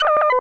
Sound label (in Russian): pisklyavij korotkij zvuk